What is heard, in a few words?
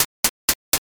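footsteps poolside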